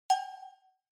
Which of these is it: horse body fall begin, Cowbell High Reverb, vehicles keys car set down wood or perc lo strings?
Cowbell High Reverb